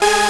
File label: synth; osu